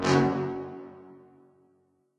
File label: fire